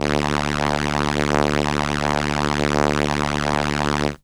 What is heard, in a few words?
Viola D2, synth, ARP Solina; musicradar-synth-samples